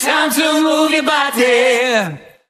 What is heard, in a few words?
"time to move your body" SUNG